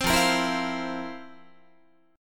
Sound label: F Major 7th Suspended 4th F, Guitar; strummed